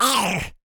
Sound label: Zombie Pain